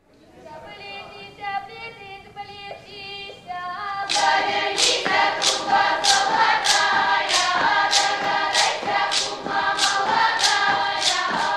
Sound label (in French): Russie; Zapletisya Pleten